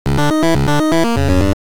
8bit; kinodoku noroi

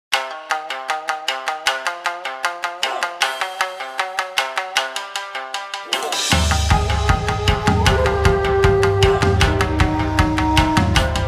shamisen samurai rock

